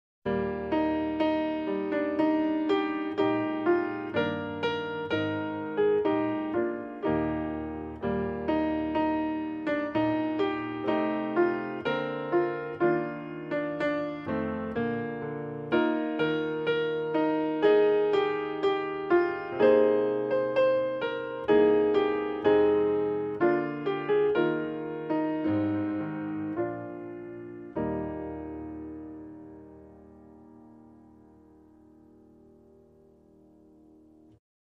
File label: Hark The Herald Angels Sing, Solo Piano